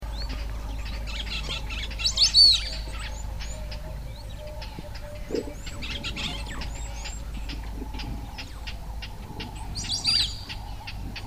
dendrocygne veuf

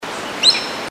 aigle de blyth, chant

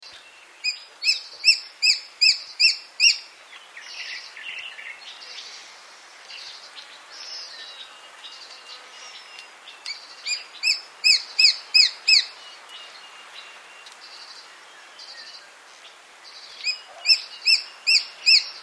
epervier minule

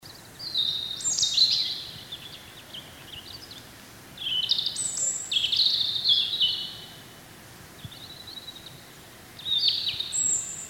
rougegorge familier

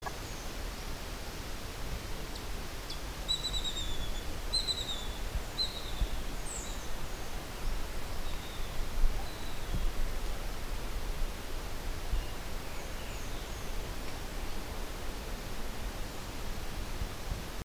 milan bleuatre

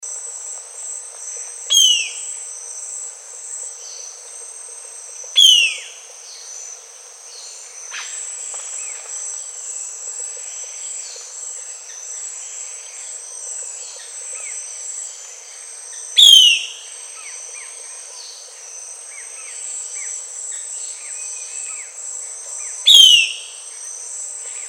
buse a face noire, chant

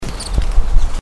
emeraude a queue courte

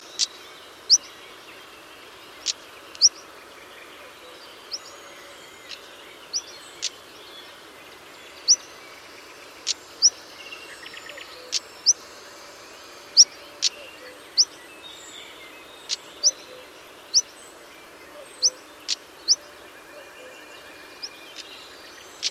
tarier patre, chant

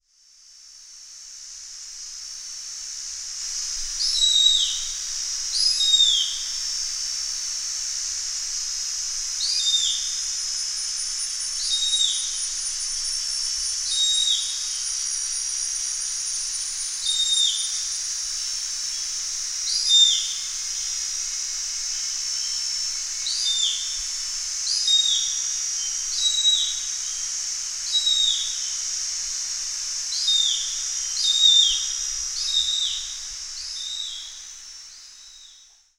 baza de jerdon, chant

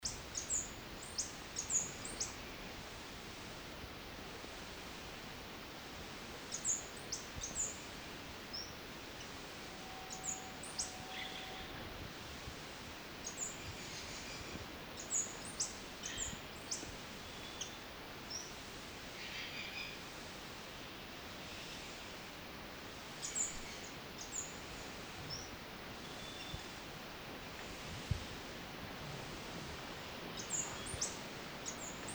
ariane candide, chant

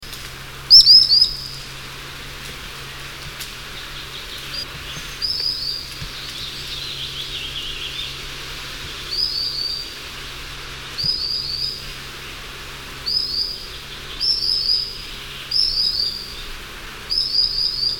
chevalier guignette, chant

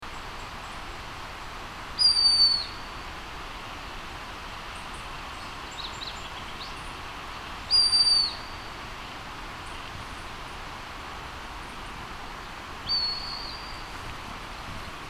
buse cul-blanc

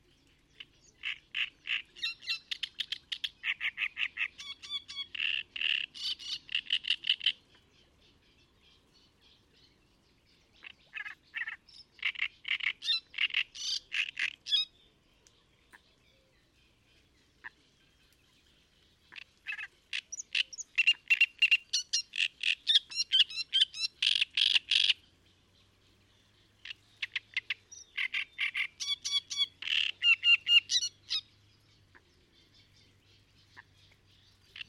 rousserolle turdoide; chant

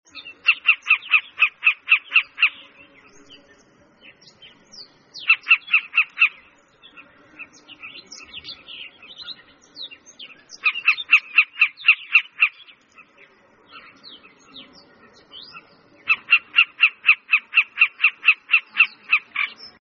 guepier carmin, chant

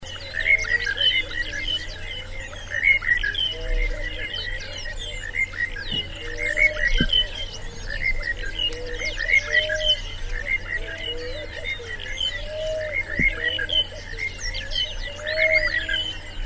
tourterelle maillee, chant

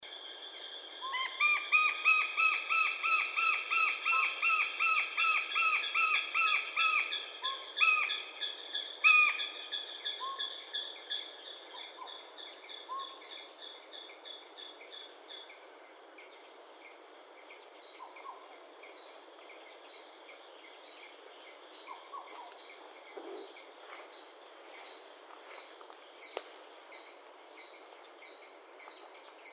autour de henst, chant

